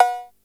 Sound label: cowbell, Yamaha RY-8